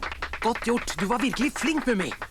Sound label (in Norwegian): applaus; mummitrollet